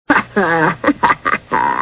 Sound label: laugh; bart